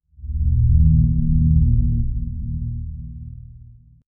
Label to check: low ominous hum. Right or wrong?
Right